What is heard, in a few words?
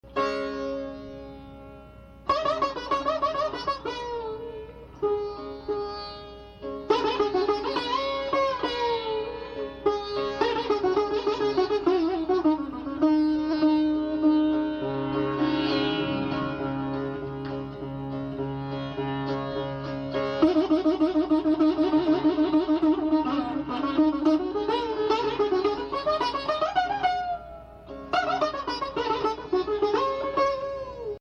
Raga angry